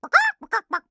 Chicken; Mage; Starburst Sorceress; Realm Royale

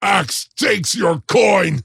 pain; axe

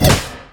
projectile launch; Crystal Maiden

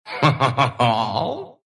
laugh, ru; dota2

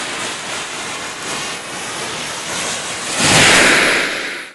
Homing Missile cast enemy

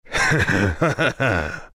laugh, zh

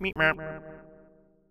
Error sound invulnerable

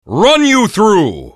attack; kunkka

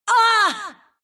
pain, ru

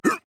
Grunt Jump

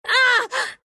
pain; dota2

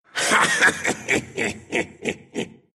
laugh, ru; Dota 2

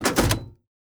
Autoturret deploy; Rust

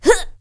pain

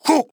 Grunt Jump; Grohk

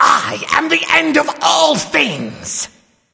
Laughing Skull; Emote; Bakasura

